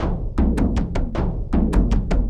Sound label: Frame Growing, Frame _ Kendang; Sonic Foundry (Sony Creative Software) - Friendly Fire; Drums